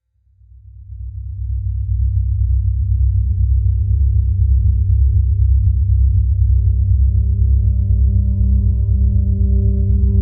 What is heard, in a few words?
ambient release